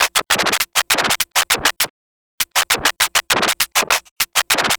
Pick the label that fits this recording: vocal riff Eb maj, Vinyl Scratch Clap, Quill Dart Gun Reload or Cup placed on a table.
Vinyl Scratch Clap